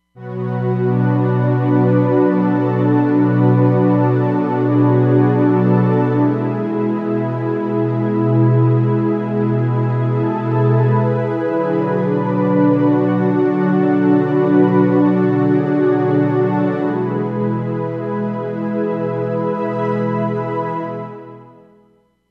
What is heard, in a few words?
JP Strings, Roland Fantom XR